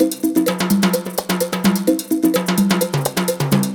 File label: Percussive Loops 128BPM; Vengeance Samples